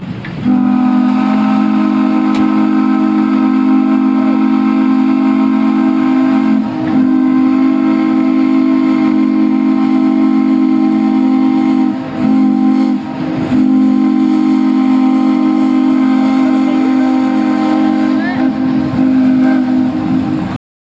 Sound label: horn